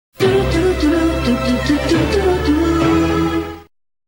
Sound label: jingle; a2; fr3